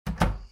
tuer; Stardew Valley

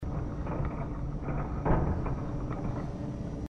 bedienen rad; inside

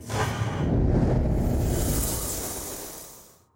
kampf feuerschaden; magic 2014

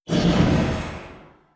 karte friedhof; magic 2014

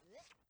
gegner schlecken; battleblock theater

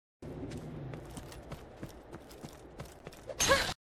Schritte; borderlands 2

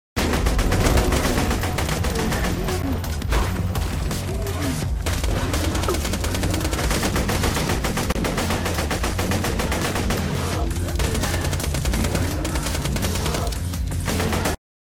musik in kampf; borderlands 2

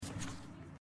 papier aufheben; life is strange

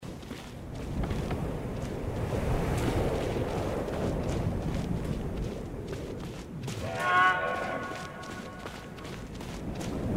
vergleich schritte; fallout 4

